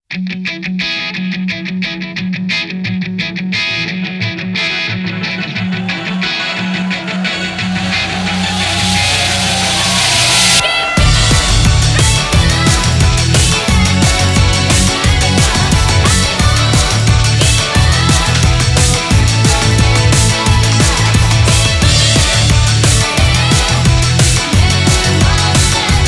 VS Instrumental; tales of the tempest

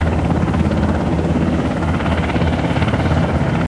Prop; Learn to Fly 3

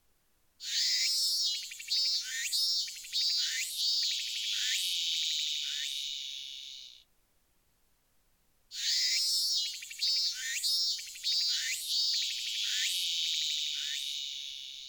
Ambience - Summer Night

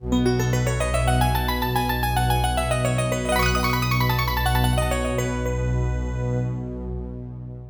Success; Kakyuusei; Saturn

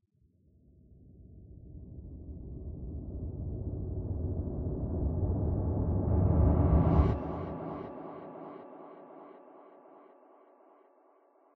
Npc Combat Reverse; The Sinking City

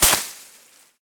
Palm Leaves; Angry Birds

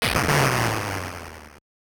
Large enemy destroyed; Gradius; MSX

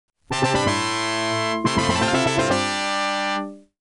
Combat Fanfare (8-bit); Wizardry 7 Gold; wizardry gold 1996 windows macos

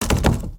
door locked; Poppy Playtime

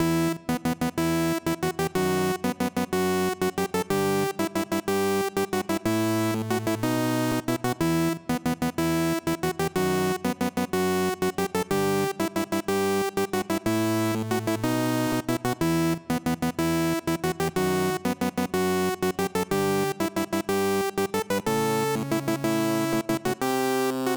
Menu Loop; Jump King

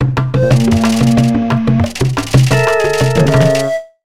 Sting Tantrum; simanimals africa 2009 nds gamerip

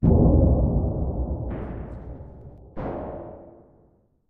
Blast

